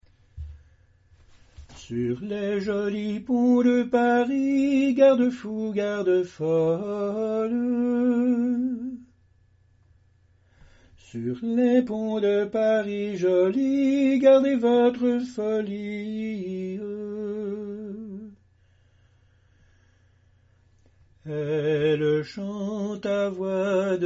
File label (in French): hommes; sur les jolis ponts de paris; Tenor